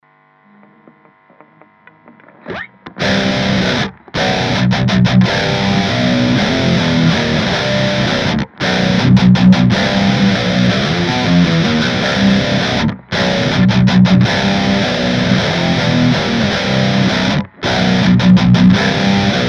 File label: Treadplate tread V30 screamer; podHD500